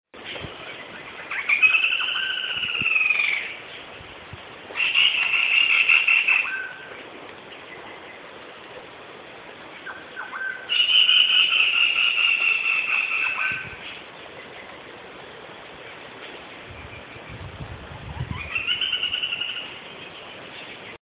black rumped flameback, Woodpecker, birds

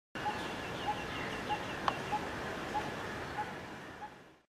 copper smith barbet; birds

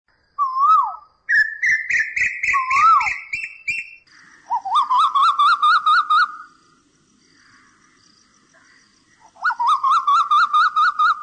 asian koel; birds

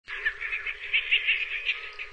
brown headed gull; birds

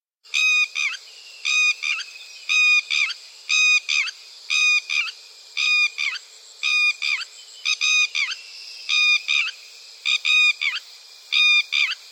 red wattled lapwing

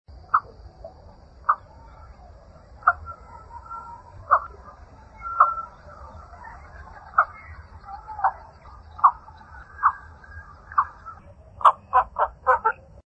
indian pond heron

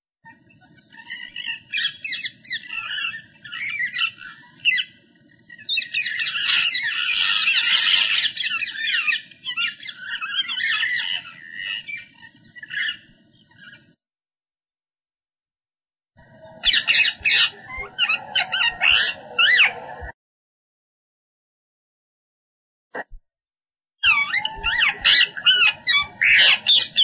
rose ringed parakeet

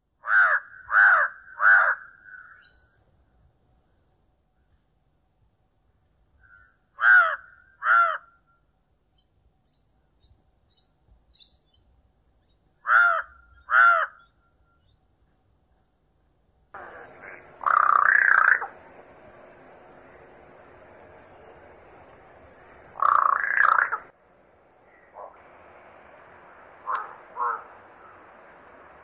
jungle crow

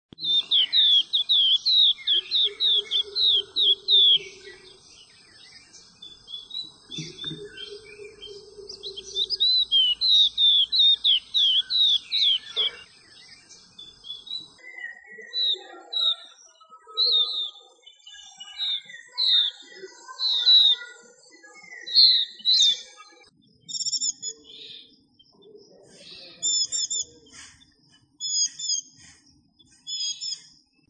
Oriental White eye

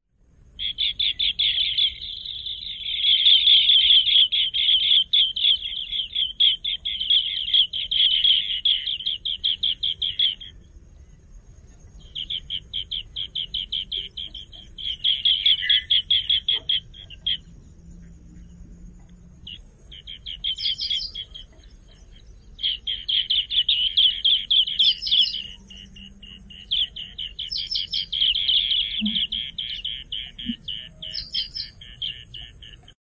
green bee eater, birds

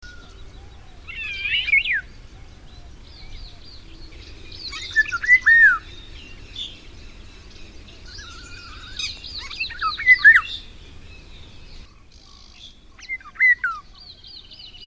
indian golden oriole

